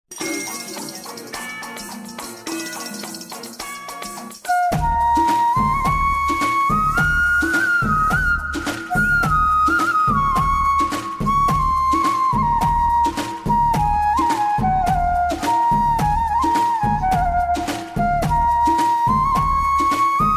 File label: flute cover